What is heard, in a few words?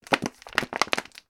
foley bottle plastic movement; sound effects one